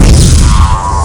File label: lasere, weapons; janus